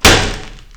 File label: kill